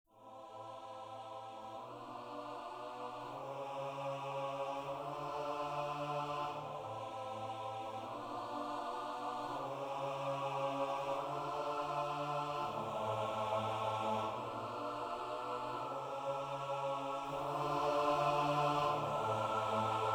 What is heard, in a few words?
Choir Layer; ProScores